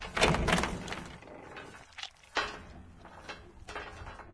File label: stalker shakes; ambient; source engine; episodic